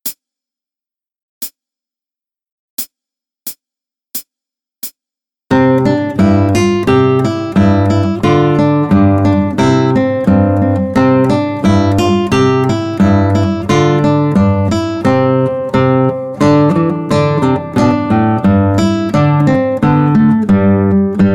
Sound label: seven step dance trad germany